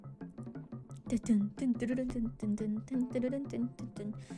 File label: Singing; IRySinging